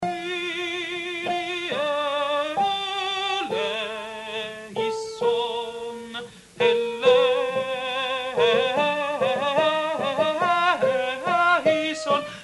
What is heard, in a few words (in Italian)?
Requiem Kyrie soprani; Mozart